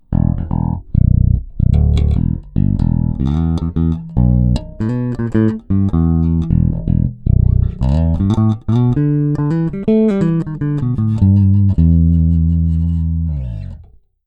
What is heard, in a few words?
Schecter C+3SS